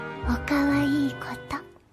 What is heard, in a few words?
Oh kawaii koto